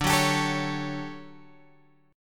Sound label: D Major 11th D, chord; DM11 Chord {10 9 X 12 10 9} strummed